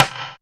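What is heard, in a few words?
rim processed, rims, fresh disco house; USB Soundscan vol.20; AKAI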